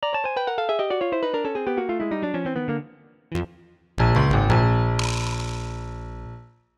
Lose

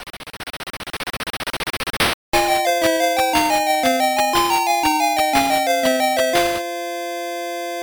failed; Gangster Town; Master System

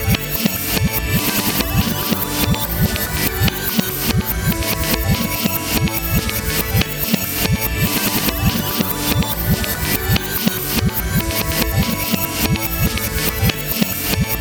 Jingle reverse; Blinx 2 Masters of Time and Space; Xbox

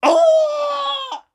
death start; Collector

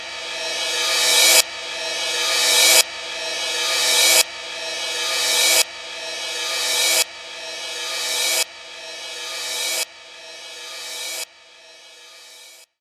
Reverse Cymbal

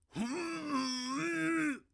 Balloon pre burst; Zote

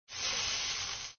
Meat Sizzle; papas hot doggeria; android; x68000

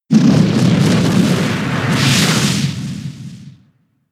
Mystical Fire; Pokemon; gen 7; SUMO; USUM

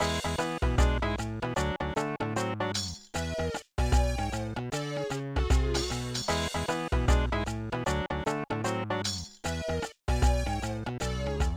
Rock Beat; PS1; I Love Work!

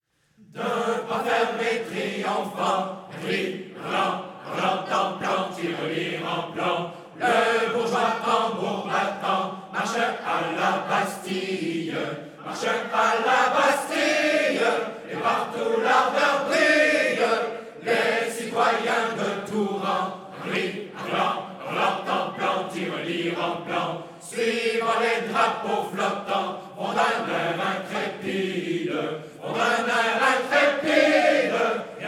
Choir; Assassin's Creed Unity

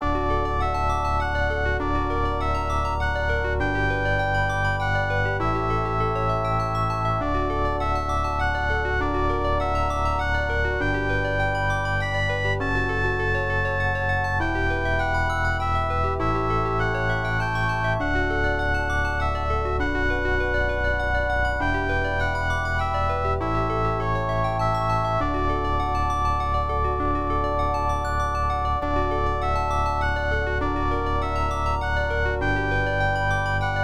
Romantic; Princess Maker Yumemiru Yousei; IBM PC AT

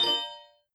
Instr T piano; backpack hero gamerip 2022

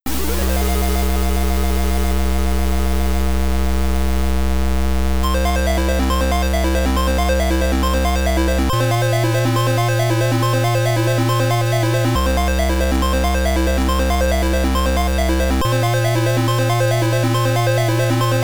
G Catapult [PSG]; Star Cruiser; Sharp X1

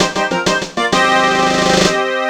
Star Catch Fanfare Negative; B3313; n64

